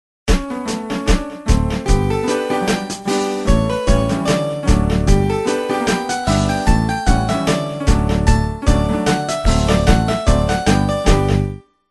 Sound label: right backing